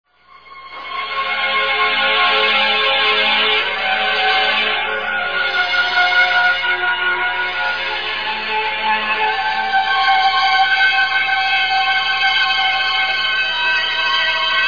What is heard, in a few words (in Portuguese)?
orgao